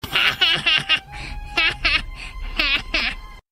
laughing; korone

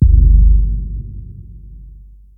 bass impact

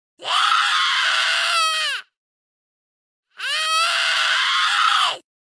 scream reverse; tamamo

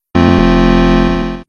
windows 3 startup

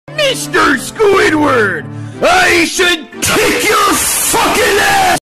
hehehe; krabs

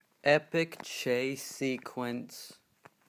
epic chase sequence

